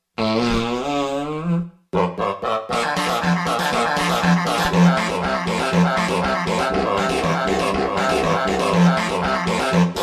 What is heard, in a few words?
Farting